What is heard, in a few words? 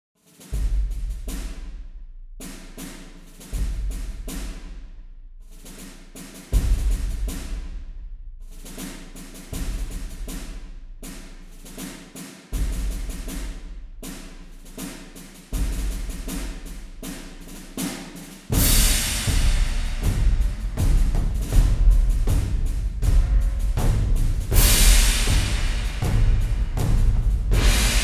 Percussion; ProScores